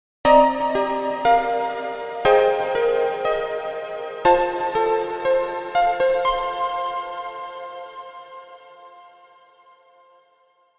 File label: Piano; Native Instruments